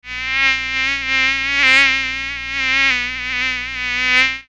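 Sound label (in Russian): жужжание комаров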